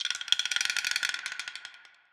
Perc Childish Rattle; Drums; Misc Percussion

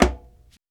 Perc Slap, Misc Percussion; Drums

